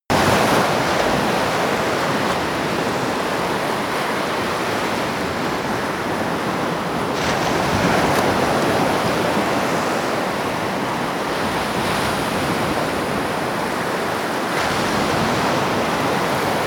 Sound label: surf stormy sea